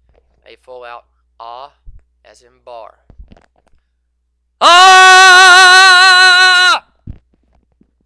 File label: Full-Out AH